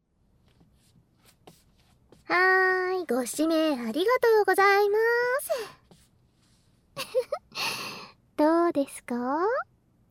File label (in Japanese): フェラ, ASMR; 反転; 口内射精コース